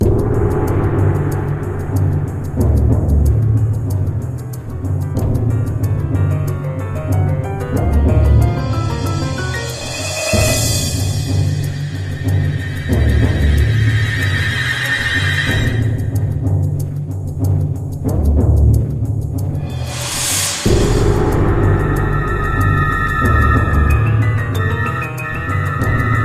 Creepy B Loop Perc; World War II Combat Road to Berlin; Xbox

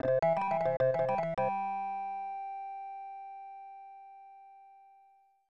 Unused Jingle; Super Double Yakuman 2; SNES

